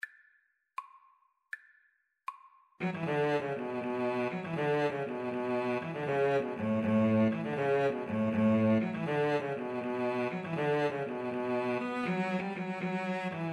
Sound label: brian boru Cello Duet